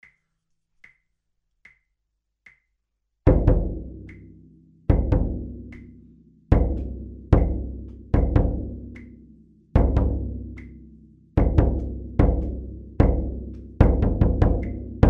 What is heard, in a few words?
BASS